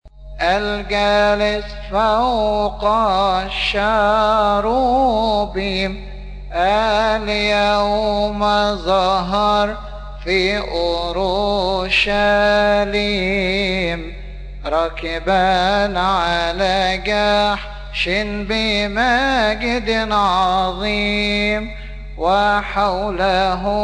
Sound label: arabic; holy week; sha3aneen; kodas; marad elengil; algales